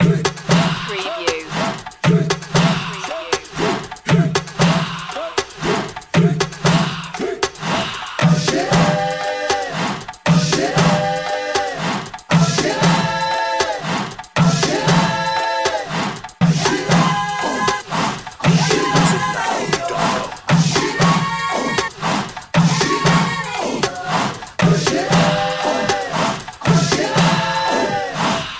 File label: tribal celebration